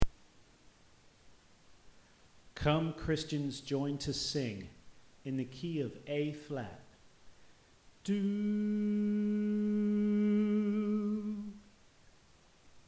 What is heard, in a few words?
Tenor Left; Come Christians Join To Sing